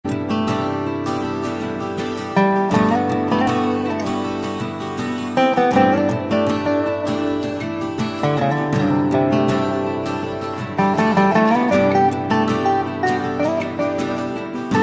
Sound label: Sms